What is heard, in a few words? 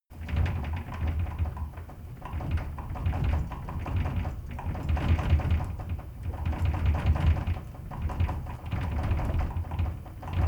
interior rattle soft